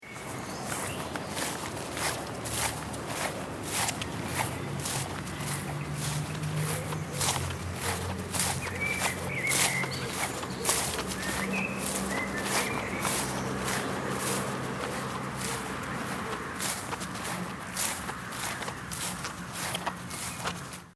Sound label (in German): Schritte auf Rasen